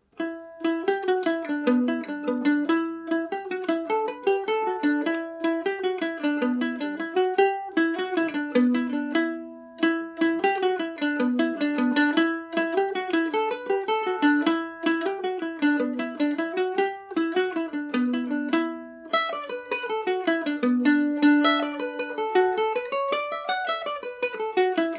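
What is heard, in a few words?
E dorian, Irish mandolin; pull the knife and stick it again revisited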